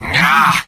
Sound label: medic negative vocalization; mvm